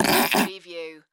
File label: Poop Fart